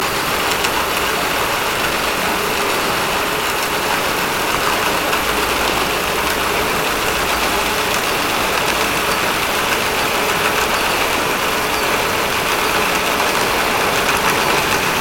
Hydrophones, orcasound

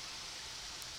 Background Noise Clips

